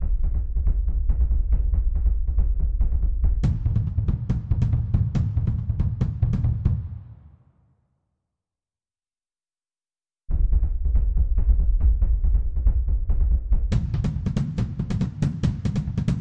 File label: Loop Layer Bass Drums